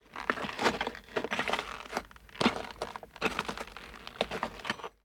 Footsteps debris long; The 39 Steps

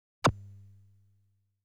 Normal Bullet Launch

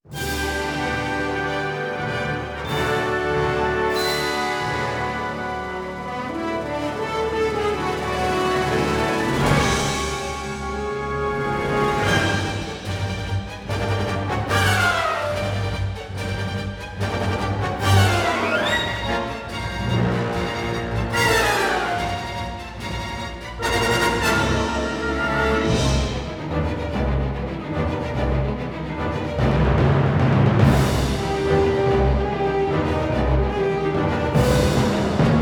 Battles Shared Rebel Win Loop

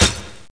hit wall

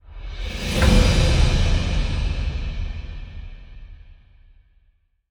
Stinger Victory Panel; starcraft 2 wings of liberty

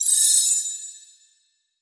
Explosion Ice Sparkle; boomerang fu

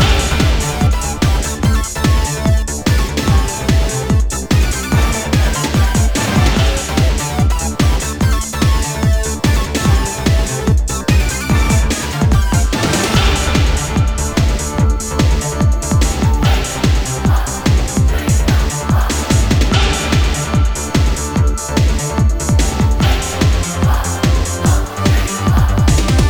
Slap Loop - 146 bpm; Fashion Police Squad

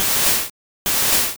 Light Screen

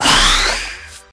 Frostbolt; solaine; firestone idle rpg 2019 gamerip

